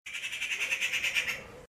bird screech short

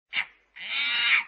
monkey dead

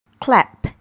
clap

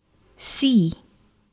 sea

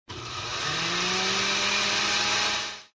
blender sfx

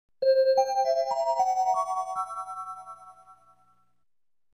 twinkling idea accent with down and up

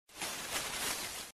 leaves rustling short

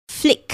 flick

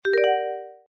correct sfx

